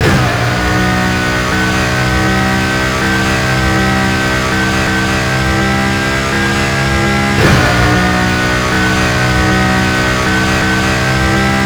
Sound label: fourth cruise loop, vehicles